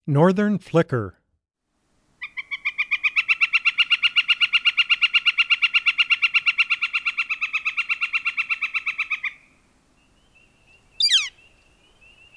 Northern Flicker, Wildlife Sounds

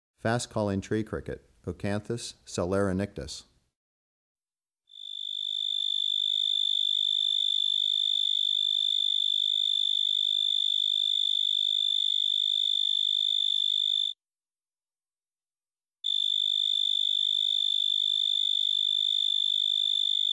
Fastcalling Tree Cricket, Oecanthus celerinictus; Crickets and Katydids

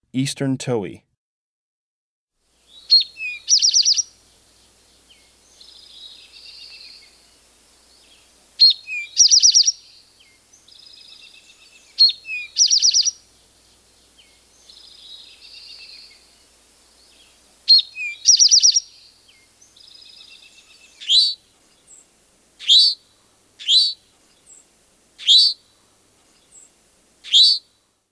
Eastern Towhee; Breeding Birds of the Chicago Region

